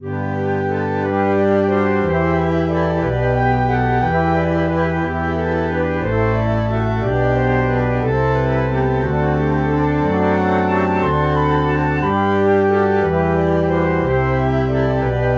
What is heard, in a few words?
flute